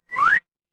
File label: cartoon slide whistle